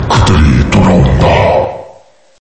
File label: zombi comeback